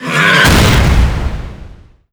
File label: centaur khan stomp; weapons; dota; creep; neutral